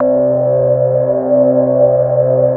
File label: MOOG BASS C4; Best Service Dream Experience